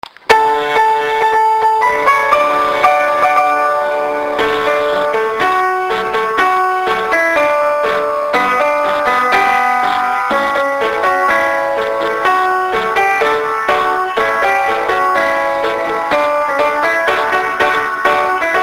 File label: school music